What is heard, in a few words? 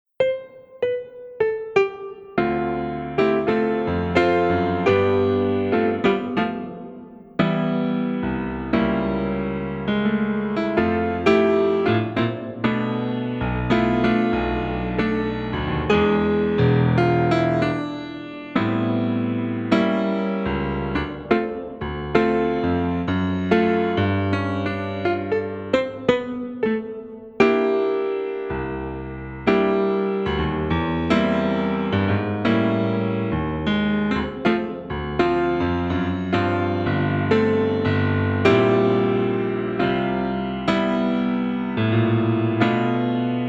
Piano